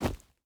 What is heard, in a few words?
footstep boots forest jump land; Rust